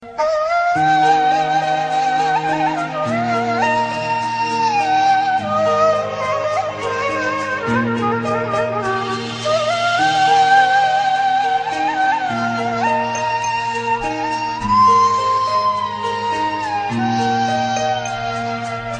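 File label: titanic arabic flute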